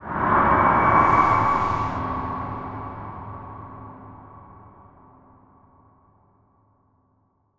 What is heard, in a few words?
Horror Ghostly; MinorHallutinationsSounds1